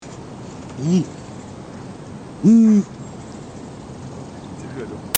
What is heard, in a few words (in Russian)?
rybnyy filin golos